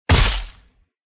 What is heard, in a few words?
Sword Hit (Impact); Зарубил; Звуки удара; Звуки удара для Форсакен